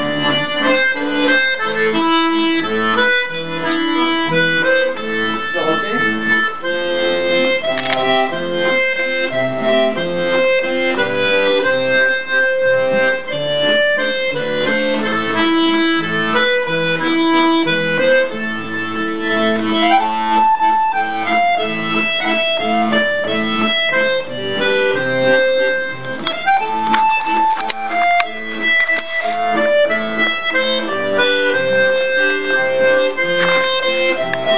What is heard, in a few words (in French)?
Voix 2 violon partie 2 et complet